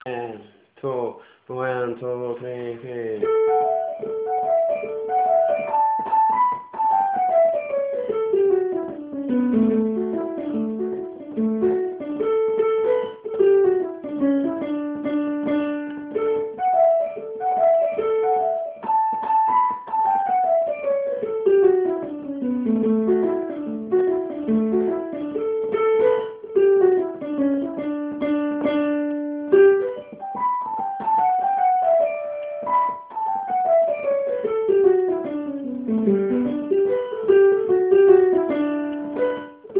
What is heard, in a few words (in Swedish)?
Skalschottis